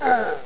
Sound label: wraith d; landslor